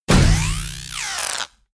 battle attacker critical; hideout

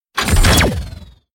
battle wall attack critical; hideout

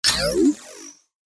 battle wall dodge; hideout

